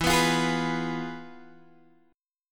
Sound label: F Minor Major 7th Flat 5th, E